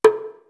BOUGARABOU, WORLD PRCS; East West; Global Sounds

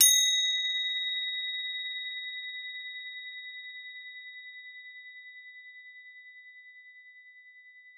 CRO B4, CROTALES; Xsample VOL-4

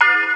FM CHIME; Hip Hop; E-MU Formula 4000 Series Vol. 1; Hip Hop Nation; Raw Phatt

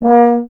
Tuba, Solo Instruments; Miroslav Vitous - Solo Instruments VOL-2

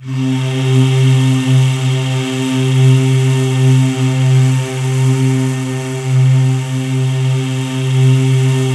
C3, Synth Leads

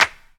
CLAPS HIP, CLP; Best Service Dance Mega Drums

